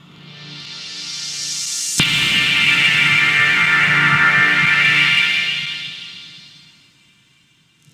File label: Melody, IDM